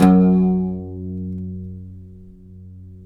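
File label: pluck OUD F#; Roland - LA Composer vol. 3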